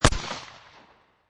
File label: Shot Gun Magnum 12 Gauge Single Shot Exterior At 45'; Shot gun / Shotgun: Magnum Eagle Arms 12 Gauge, Single shot, Exterior, at 45 feet di